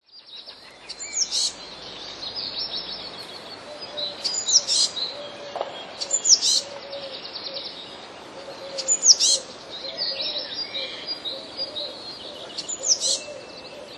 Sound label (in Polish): spiew; nieco inaczej śpiewającą modraszkę; Na tym nagraniu wszystkie piosenki są czterodźwiękowe